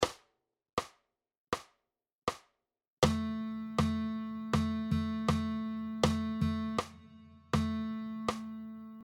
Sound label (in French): Dictées de rythme, guitare